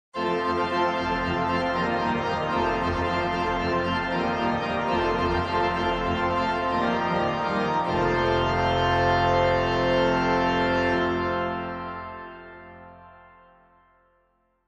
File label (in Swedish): fanfar